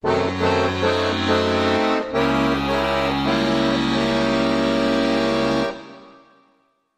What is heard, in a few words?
Accordion Suspenseful Bridge